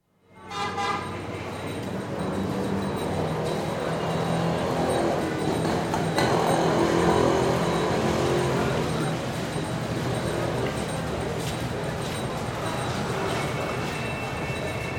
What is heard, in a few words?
Calcutta: City street with traffic (rickshaws, buses, cars, etc), footsteps, bells, some distinct speech; BBC